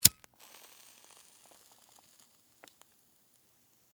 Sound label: Cigarette Light, Foley, Cigarette, Light, Inhale, Sizzle; Smoking; Human